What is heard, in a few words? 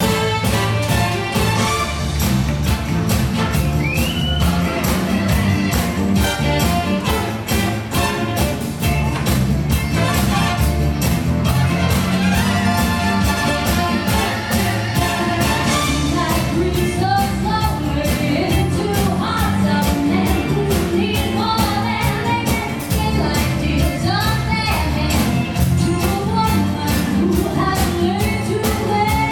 R&B